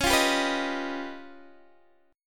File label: Db 9th Flat 5th Db down Guitar Open D